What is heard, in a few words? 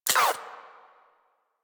SMG Mech, weapons; Protea